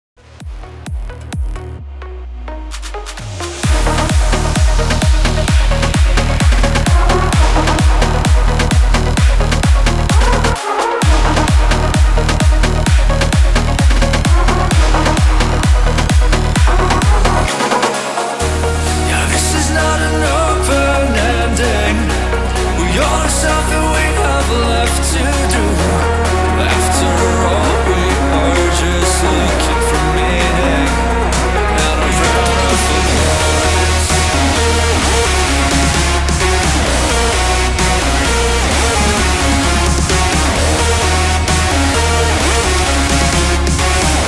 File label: 130 BPM